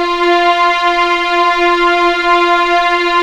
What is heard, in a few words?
F4 EXP MF, VIOLINS; East West; The Ultimate String Collection